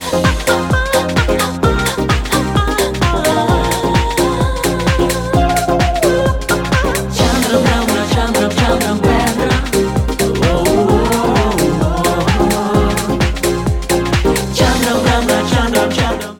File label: lalala